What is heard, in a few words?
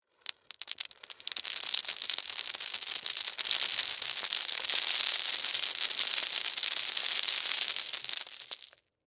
Debris Lima Beans; Texture; Spill; Materials; BlastWave